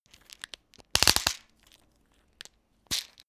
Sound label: pillbox close